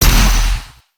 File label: player dash